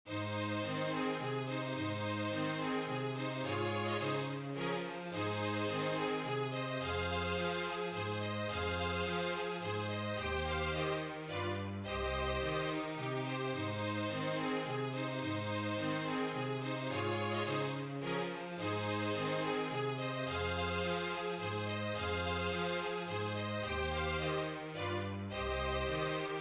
Brahms Waltz Flute Trio, Waltz Op.39 No.15 in A flat